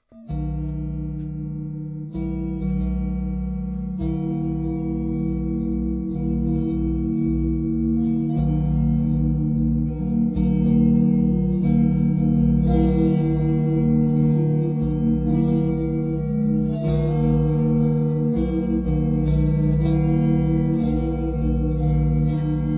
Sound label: bells n drones 10edo